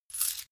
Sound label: coins grab